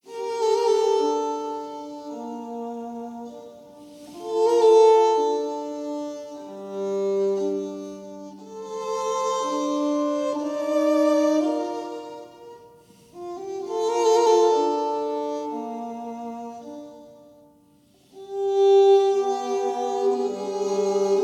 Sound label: Elegie Version for Violin Solo